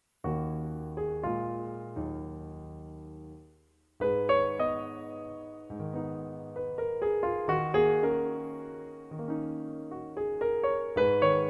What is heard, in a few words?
temps lie sarabanda, pianoforte